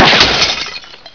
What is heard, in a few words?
shoot plate; FastGun3